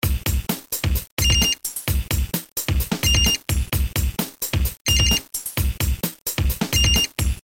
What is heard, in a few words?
Crushy Beat